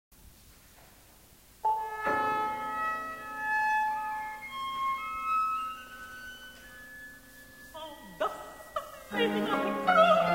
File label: crocodile ensemble